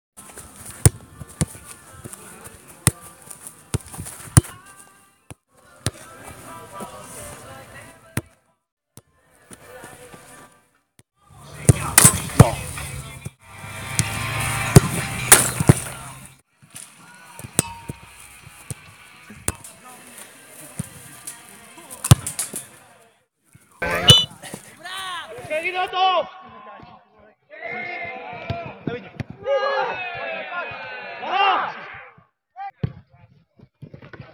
football match

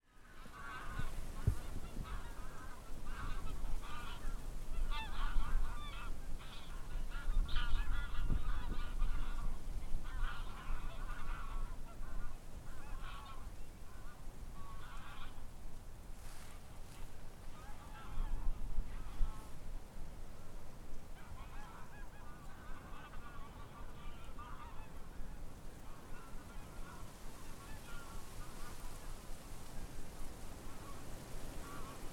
beach birds

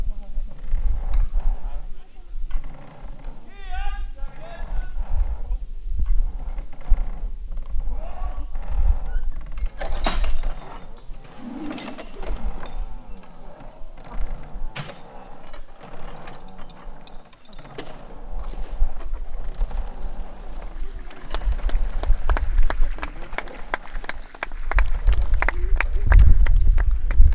great trebuchet

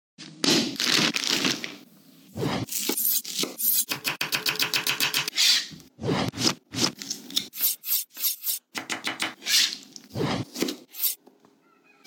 Cooking

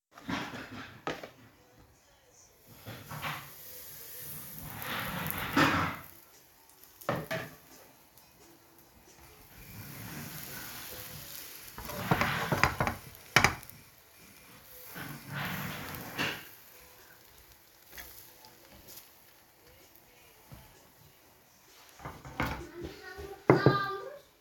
Cooking Pancakes

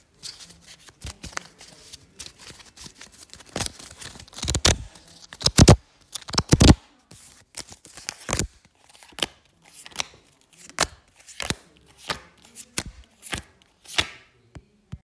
Cards game

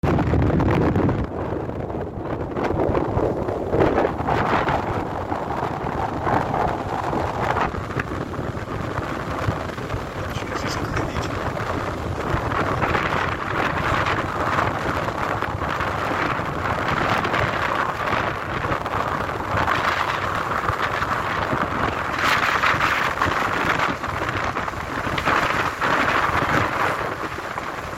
Wind

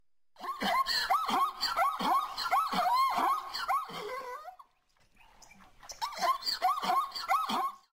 Zebra

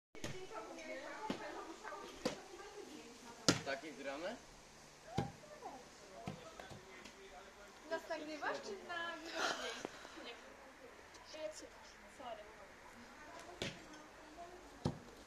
Volleyball Match

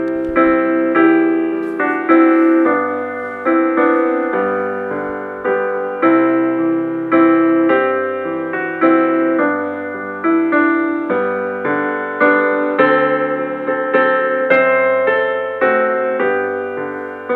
xmas tune

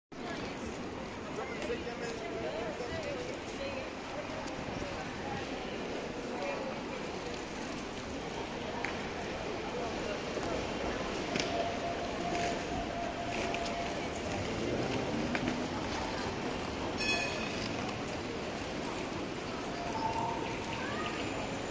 Sound of the streets

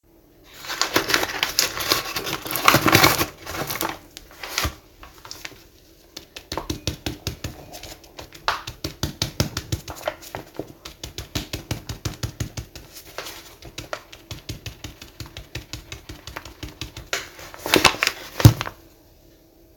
Measuring flour

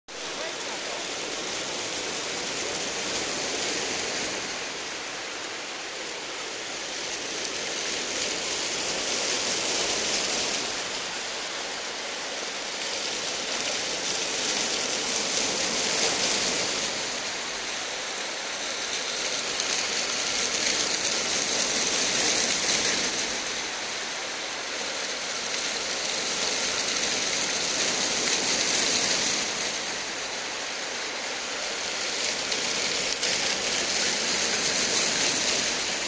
Fountain

